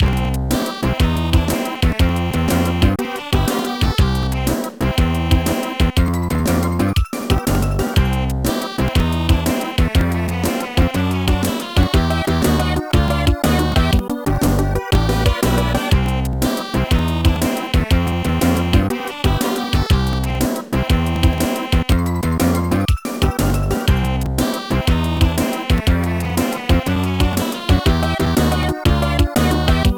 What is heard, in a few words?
Character Ending; Dragon Ball Z Shin Butouden; Saturn